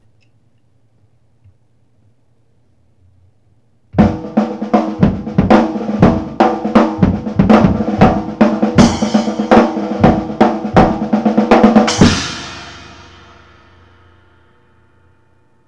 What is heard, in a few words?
break snare sync 120, acoustic